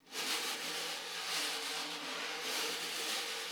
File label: Vintage Snare Drum; Q Up Arts; Johnny.C's Vintage Snare Drum; 5.5X14 SLR